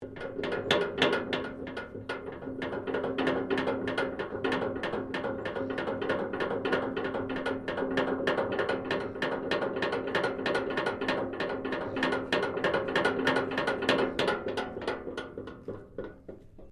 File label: MECHANICAL SUSPENSION METAL SPRING WOBBLE LONG; Variou smechanical sounds; Wobbles (Sound Effects:Objects)